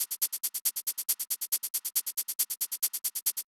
Manhattan Kit 138BPM Loop, Drums & Perc; G#minor; Vengeance Samples

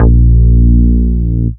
Root A#, Ultimate Bass; Vengeance Samples

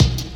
kick; Breaks N Beats Sound Kit

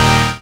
Synth Shot; Vengeance Samples

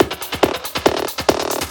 Fill 140 BPM

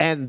Vocal Oneshots; Vengeance Samples; Vocals

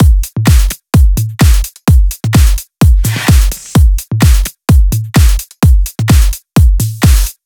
128BPM Drums; Vengeance Samples; Economic Kit

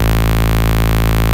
Bass Root A

